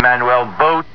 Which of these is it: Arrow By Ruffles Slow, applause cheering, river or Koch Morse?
river